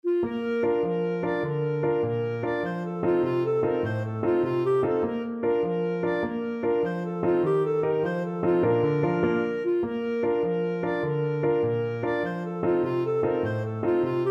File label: new rigged ship, clarinet